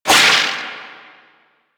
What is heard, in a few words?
Void Projectile; Weapons; Warframe; Duviri; Incarnon Adapters